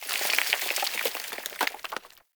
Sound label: Falling Sand Rock; Warframe; Man In The Wall